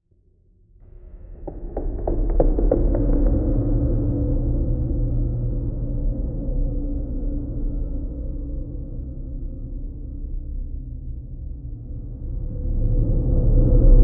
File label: cinematic horror sfx of s, concrete